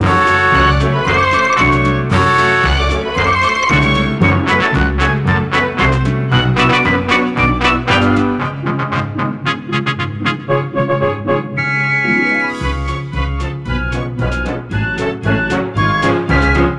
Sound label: Guit; simpsons hit run; ps2